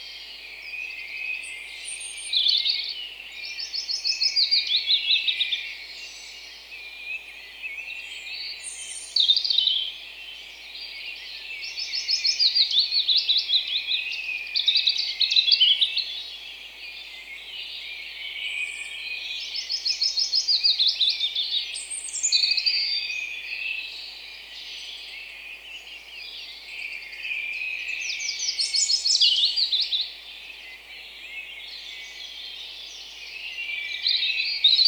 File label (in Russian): maiskii les